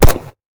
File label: mp5 fire stereo; midnight guns